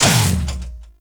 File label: Mech Run Stop; Nechro Mech; Vehicles